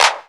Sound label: natural clap one shot g sharp key